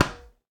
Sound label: Tennis Racket Ball Hit Hard